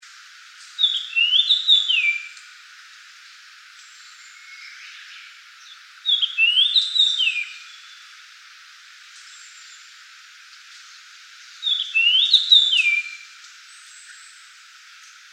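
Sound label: ikaru S, Japanese grosbeak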